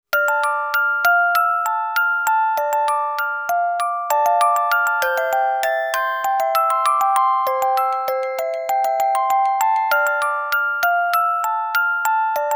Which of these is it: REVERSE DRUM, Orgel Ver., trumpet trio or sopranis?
Orgel Ver.